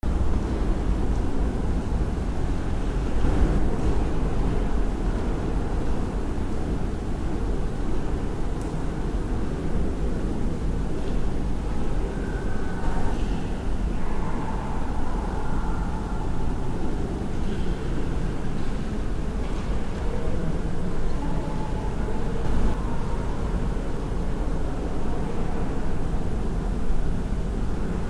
religious large open cathedral

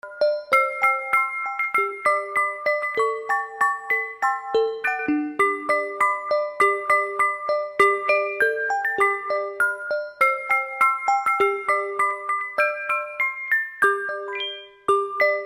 music box jingle bells

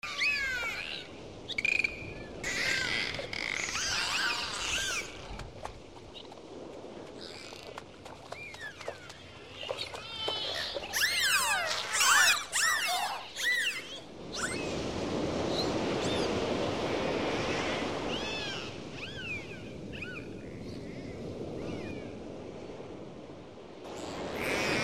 atmo animal dolphins

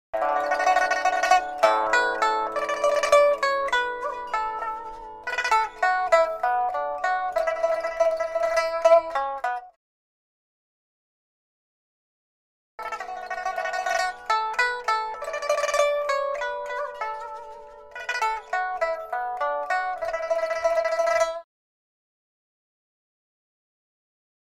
asia pipa

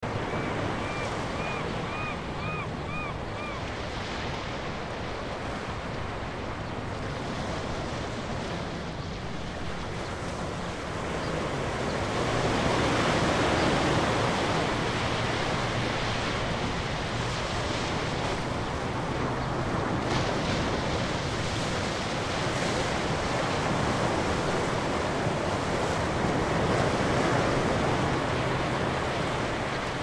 surf and birds; pismo

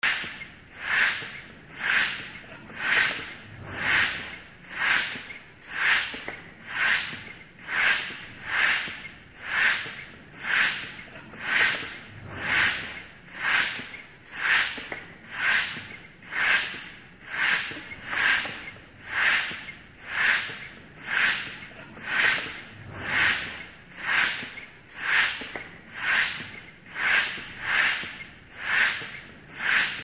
atmo steampunk air pump

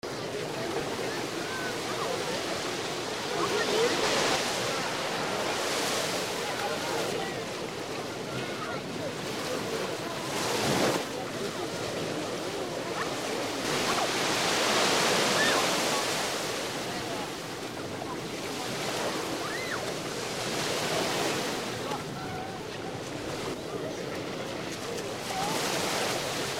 waves at the beach

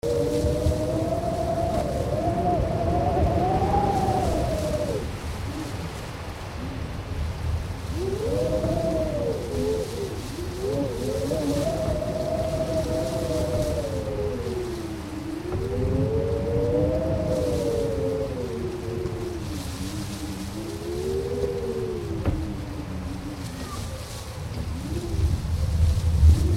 dark howling wind leaves owl

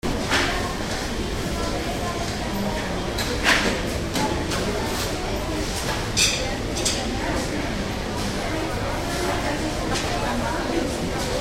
interior; germany berlin europa center

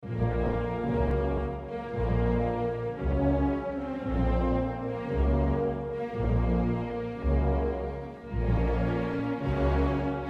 dark funeral march

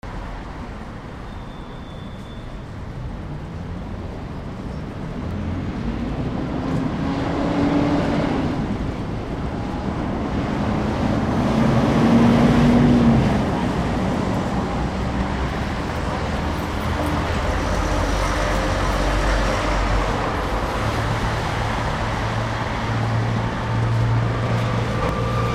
exterior traffic and pedestrians; germany berlin marienkirche

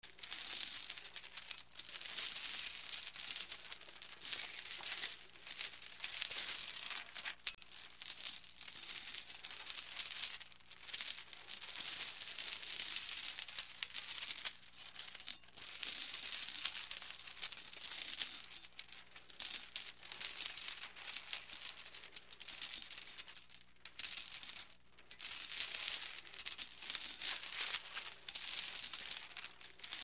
dark rattling chains

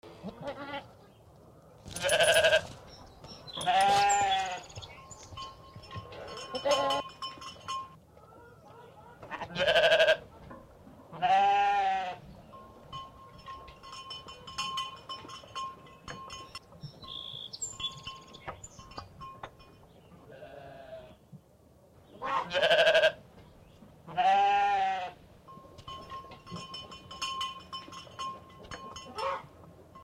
atmo farm barnyard mix

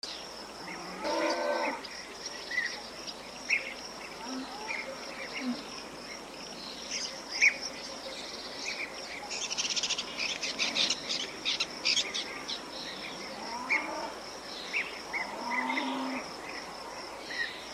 farm open field distant cattle

